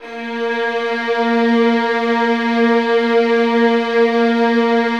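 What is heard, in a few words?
2nd violins sustain a#3; Sonatina Symphonic Orchestra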